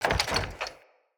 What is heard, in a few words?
Wood Double Door Locked; UnderCity